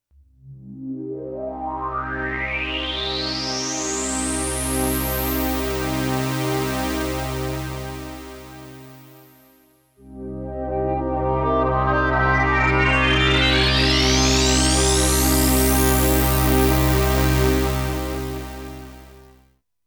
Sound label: OB-X8 vs A6 LP Reso A6 full